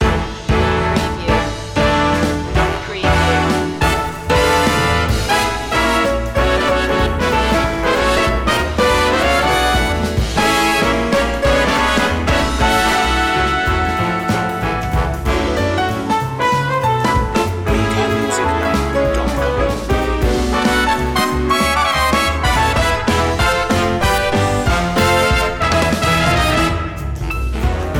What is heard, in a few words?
big band jam